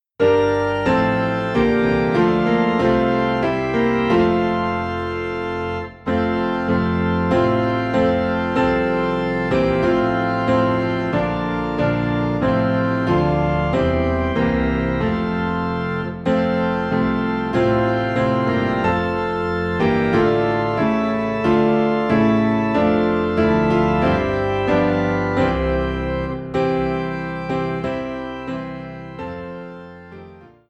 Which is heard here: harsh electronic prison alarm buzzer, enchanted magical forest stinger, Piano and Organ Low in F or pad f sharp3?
Piano and Organ Low in F